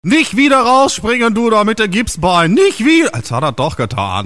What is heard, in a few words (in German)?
Jingels